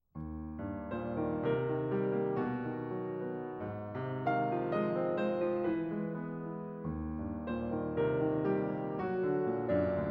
Tuba Concerto for Solo Tuba and Piano - Andante sostenuto